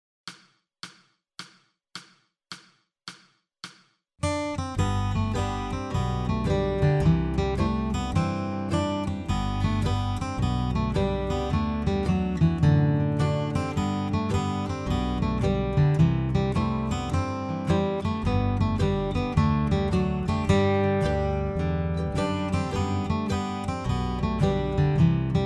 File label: Guitar Melody Slow